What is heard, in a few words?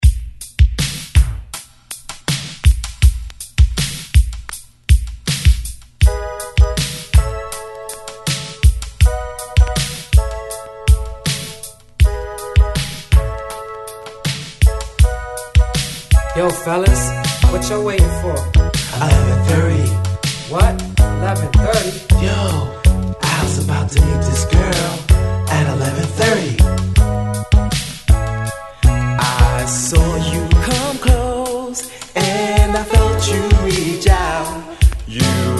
Disco